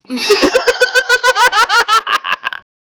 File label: laughing demon, spell